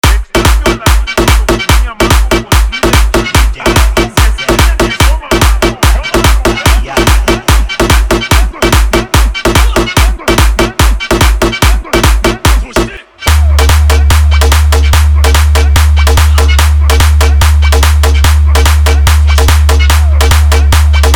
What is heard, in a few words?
PHONK